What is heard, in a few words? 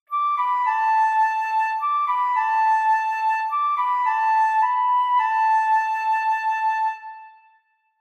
Ocarina; Ocarina of Time